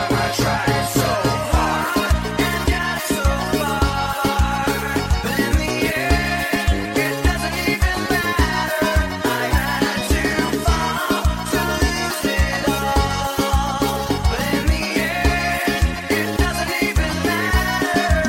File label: versao forro; brazil